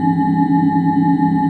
Tractor Beam Loop; SFX Kit; Sci-Fi Loops

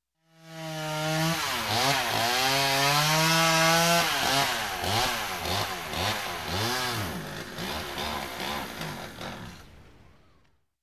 Chainsaw Running, Stalls, Logging; Sound Ideas 2000 Series

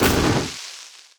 Fire Enemy Footstep; AudioWizards

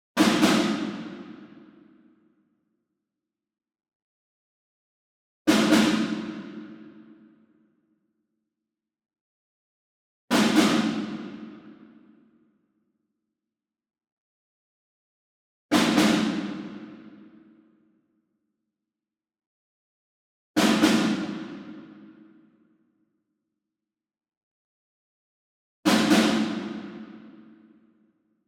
ENSEMBLE SNARE Group Double Far; boom library; Cinematic Strikes Construction Kit

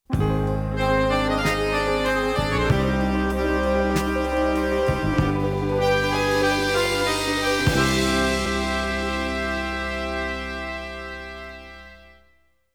Italian, jingles

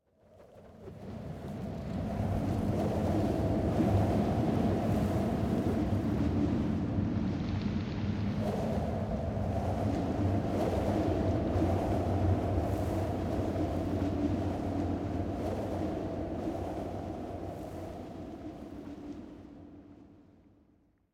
Wind Gusts Heavy Cold Whistle - Low Wind Moan; Hollywood Edge; sound designer's toolkit v2

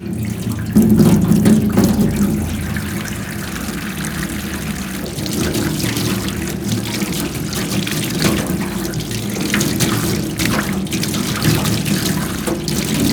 car wash hand; best service Blue Box

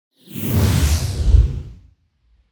Whoosh Strong Emission - Going Down; Hollywood Edge; sound designer's toolkit v2

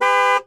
98 Trans Am Horn, Automobiles

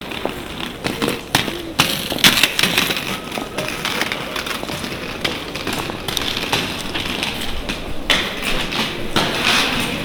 rollerblade street; best service Blue Box; sports

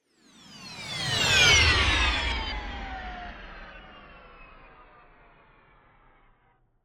Space Ship Passing Fly By - Slow Down Sci-Fi; Hollywood Edge; sound designer's toolkit v2

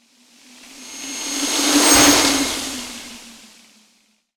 Ice Spell Whoosh, Cold Whooshes; Crystal Chill; Arctic Aura

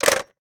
Telephone Receiver Down; Communications; 1001 Sound Effects

